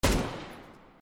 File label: M249 atk